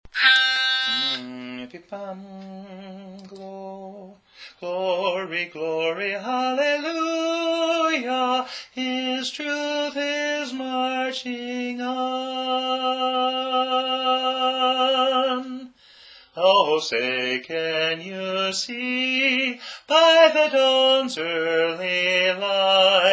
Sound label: Tenor; The Star Spangled Banner